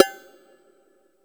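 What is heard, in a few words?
stereo perc, hi-tech kit; Crypton - DrumBase5000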